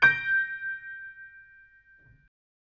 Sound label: Gm highest, chords